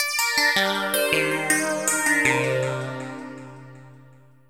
ELECGUIT, AMBNT, 160, C; Ambient; Guitar

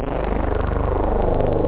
Martian; da capo vol1; drums bass

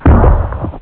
Bazooka Sound; Woden 1.3.4

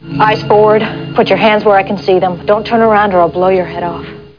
critical stop

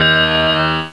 hi bell c; Sound Effects for Multimedia - Volume 1

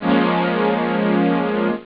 nuke, synth

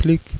keyboard; Dosgames 2.0

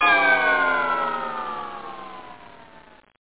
Orbster Attack; Más de 2,500 Juegos; GameRes

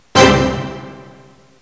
ORCH HIT; Presentation Partner

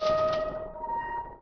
DOOR; Hidden & Dangerous; h&d demo

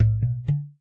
BUTTON CLICK; Age2XTrial

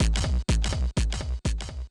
DRUM SHOT HOUSE 125; eJay; Singleshots

